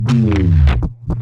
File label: Bass F Moving